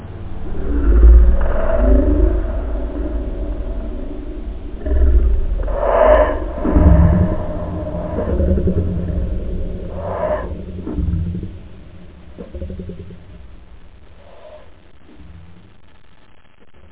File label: vocal